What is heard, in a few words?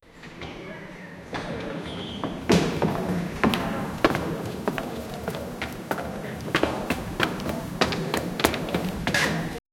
people walking in the hos; hospital